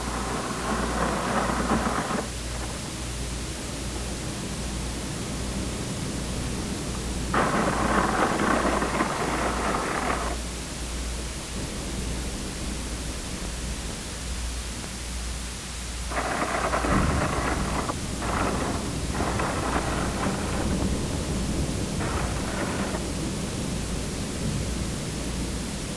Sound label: Crab scampering